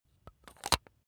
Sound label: razor battery compartment close hard